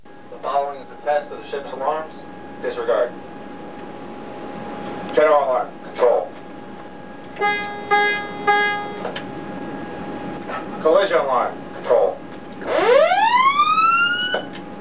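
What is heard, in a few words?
688 alarm, AUTHENTIC NAVY ALARM SOUNDS; Submarine - Alarm Testing - AN/WIC-2; 688 class Submarine; this was a test of all alarms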